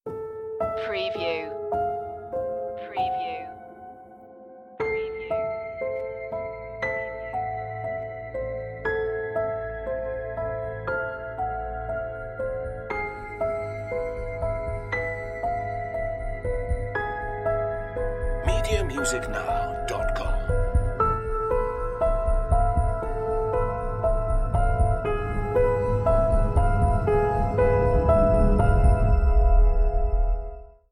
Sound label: the paradox, horror